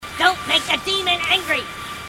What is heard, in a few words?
grunt; h3dialogue; grunts